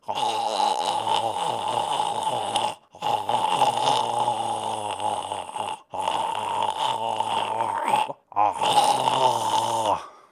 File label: sing; Dreg Husk